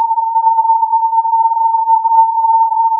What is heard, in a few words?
filtered input